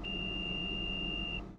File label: Door Energise, Train; OpenBVE